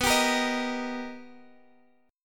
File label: B Augmented Major 7th, down; B+M7; strummed